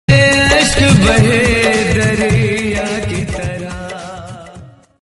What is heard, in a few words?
Hindi Song